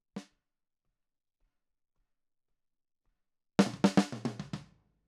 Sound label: Snare